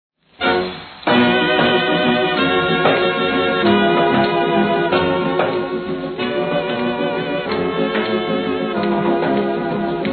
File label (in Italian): La Rumba, Orchestra; Inciso il 15 Ottobre 1947